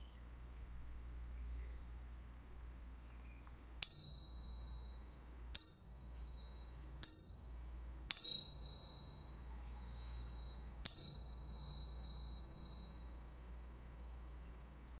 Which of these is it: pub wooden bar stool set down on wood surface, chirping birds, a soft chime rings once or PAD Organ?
a soft chime rings once